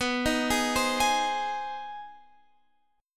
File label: Ab Major B; arpeggiated; Ab/B; JGuitar